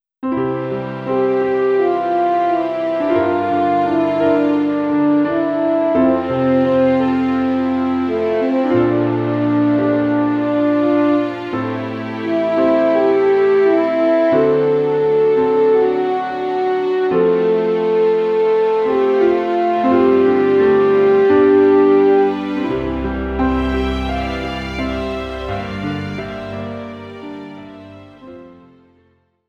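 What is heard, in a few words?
background music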